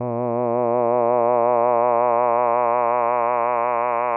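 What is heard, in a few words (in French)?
A, un "a" d'une voix de basse, variation de la phase d'ouverture relative Oq de 0.5 à 0.05, avec vibrato; sans vibrato; AteliersHenrich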